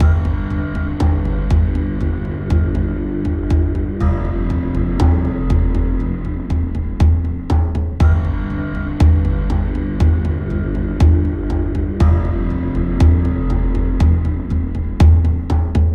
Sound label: Theme 60BPM; Themesongs; Cinematic Trailer Music